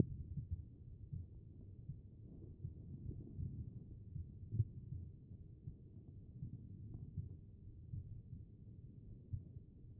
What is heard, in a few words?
HEART; B MODE